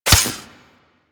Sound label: Melee Dagger Impact Robotic Flesh